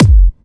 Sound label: raw kick F sharp key, bass drum sample tuned to the F# note. Loudest frequency: 509Hz